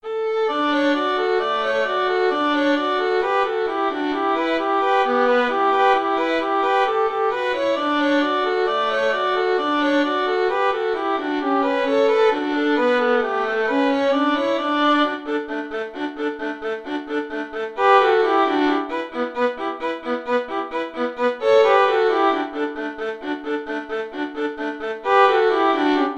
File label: devils dream violin duet